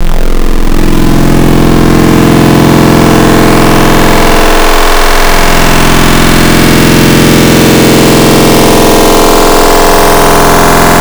LoFi FM, Wavetables; Cymatics; Secrets Wavetables; Serum

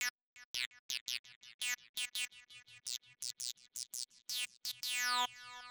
Synth, 084 Gmin

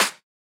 EDM Future Clap; Big EDM; Future House Tools; Claps

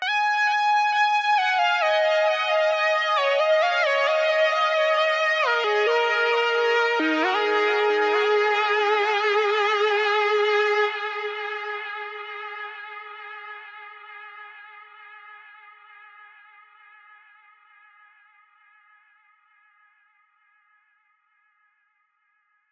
133 BPM D# Min Lead; Cymatics; Utopia; Destiny Gold Expansion

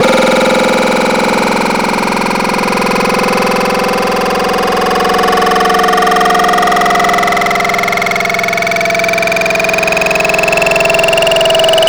Upchucker; Wavetables; Cymatics; Vomit for Serum Vol 2

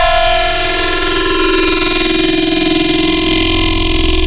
Growl Table, Serum; Cymatics

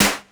Snare Hit; 088 C#min

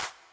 Clap, 090 E min

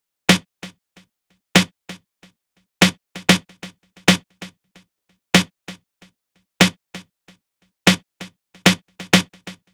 Snare, 095 Ebmin, Drum Tracks; Hip Hop Exotica; Big Fish Audio

